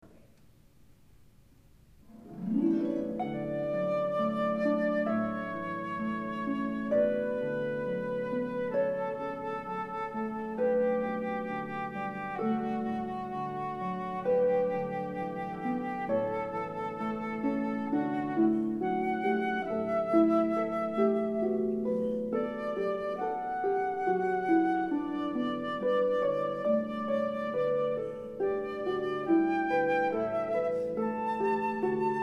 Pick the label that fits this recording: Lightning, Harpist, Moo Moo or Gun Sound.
Harpist